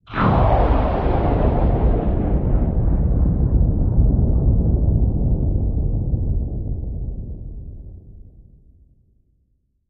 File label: Sm Underwater Gunsh; explos